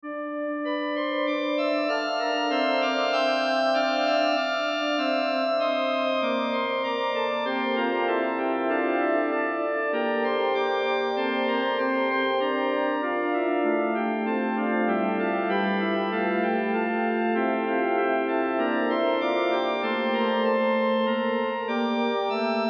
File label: organ